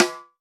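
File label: snare R-Ring, EMU Orbit-3 drum machine; 300 Drum Machines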